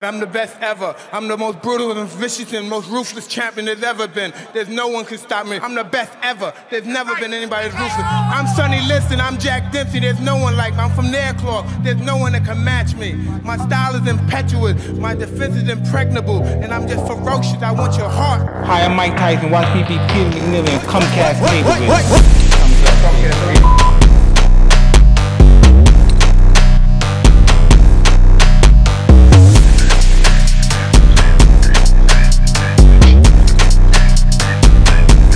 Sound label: phonk